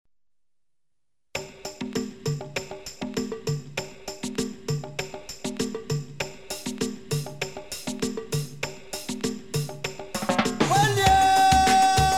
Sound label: SAMBA REGGAE